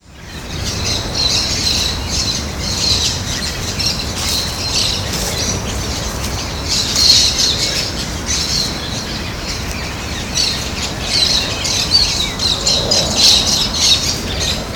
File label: Brotogeris tirica flock; Ibirapuera; SP